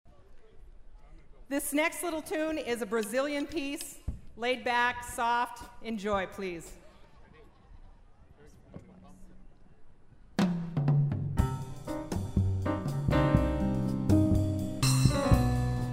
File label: Gentle Rain